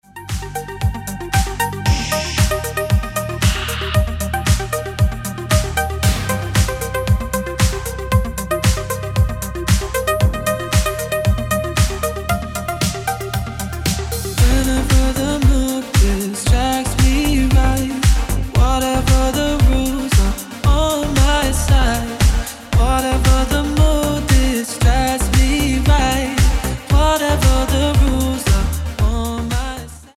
Ringtone

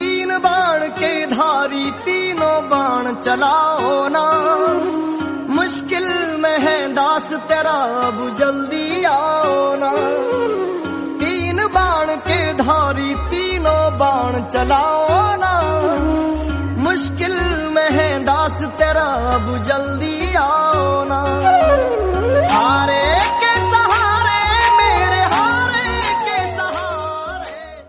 Song Ringtone

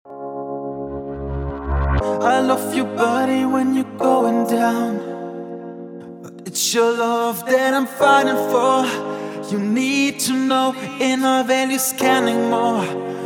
Original Mix Ringtone

